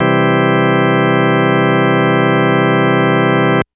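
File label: Organ B3 major; TrashLibrary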